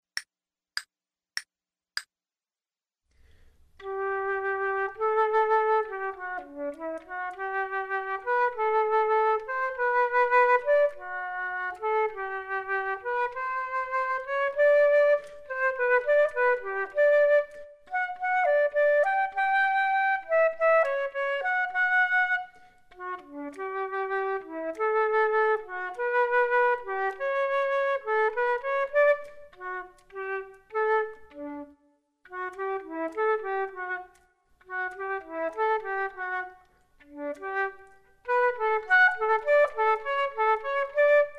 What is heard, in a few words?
Duets flute; Selected Duets for Flute Podcast